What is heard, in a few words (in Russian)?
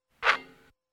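myach skvoz setku, basketball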